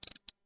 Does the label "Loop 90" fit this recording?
No